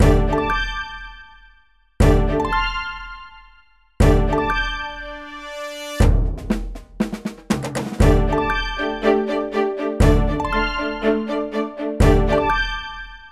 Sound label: Camera Tension; Horse Life 4; 3DS